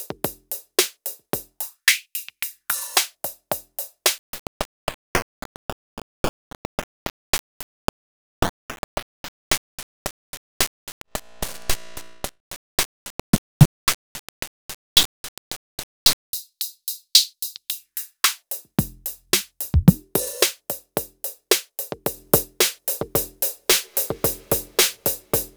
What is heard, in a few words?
inst beat